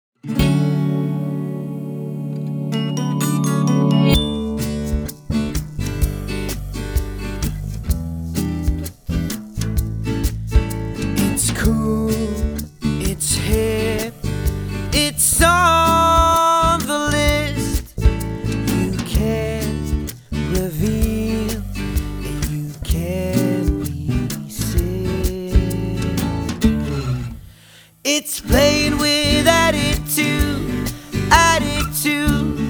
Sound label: soul